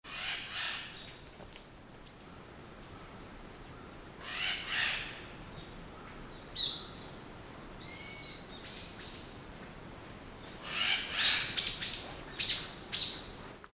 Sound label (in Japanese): Rurikakesu